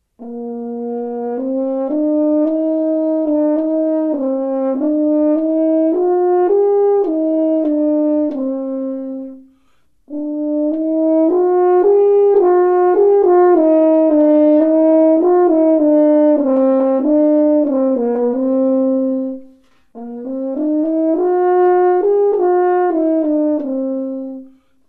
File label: horn